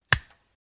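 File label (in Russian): сломали палец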